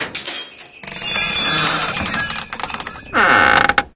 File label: item open holiday crate